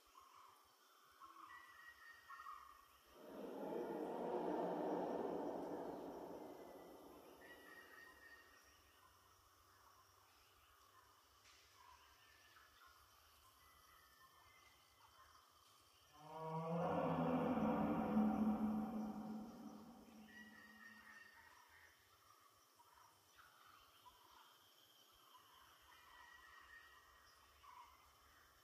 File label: Caveman Loop; doodle jump 2; android